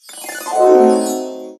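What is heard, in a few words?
sorcerer apprentice hat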